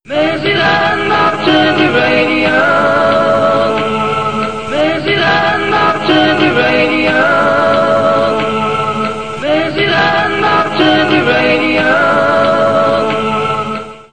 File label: Alternative Radio, Jingles; MAR; Merseyland Alternative Radio